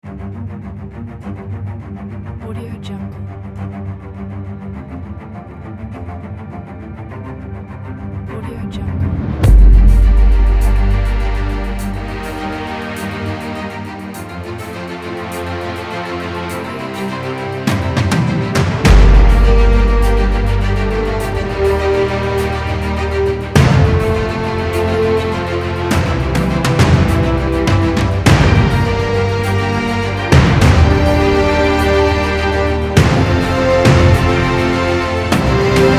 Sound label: inspiring trailer soundtrack; sonicscore1